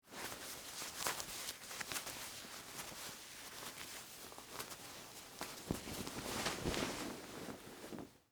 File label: Dress Shirt Unbutton, Foley, Clothes, Dress, Shirt, Unbutton, Buttons, Take, Off